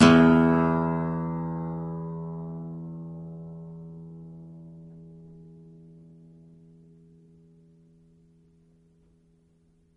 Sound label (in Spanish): guitarra